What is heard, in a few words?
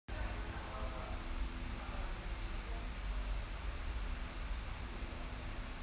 hmph